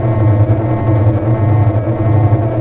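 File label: crystal loop, ambience; kejim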